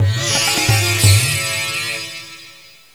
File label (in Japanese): Trinityのシタール、SC-88のEthnic Kit。; India1